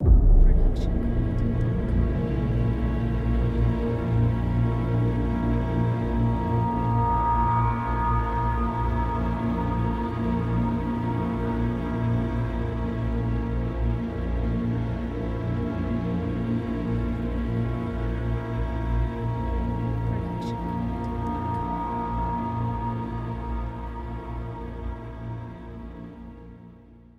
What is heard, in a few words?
Suspenseful Ident; soundscrate